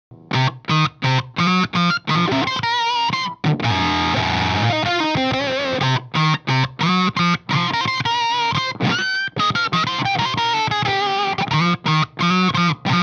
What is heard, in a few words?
FUZZ plus OCT